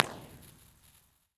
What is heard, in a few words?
Avenue 52 Underpass LA River, Impulse Response; EchoThief Impulse Response Library